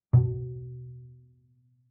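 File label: Bass pizzicato fortissimo sul D B2, Double Bass; MIS Pitches - 2014; Strings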